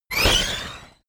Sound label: sci-fi laser shot up; soundpool